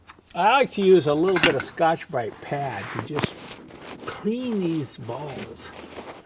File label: scorch brite pad